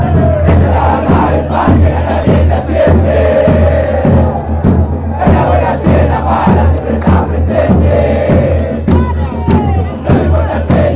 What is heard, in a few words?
deportivo merlo chant